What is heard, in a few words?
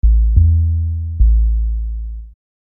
Low Sub; Construction Kit; HIP-HOP; Sonic Foundry (Sony Creative Software)